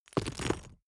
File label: stone drop small stones hard